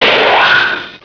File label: phaser